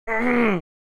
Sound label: Frustrated male grunt; scream